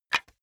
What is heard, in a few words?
wood board small drop; objekte; holz